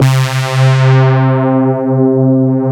CMI PIANO

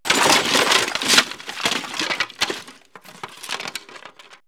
Debris; X-Static Goldmine

